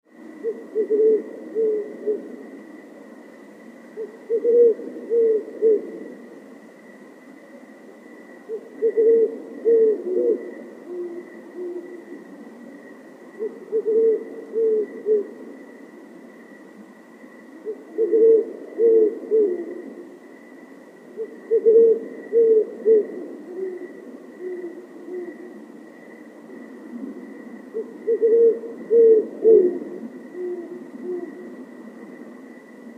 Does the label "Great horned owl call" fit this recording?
Yes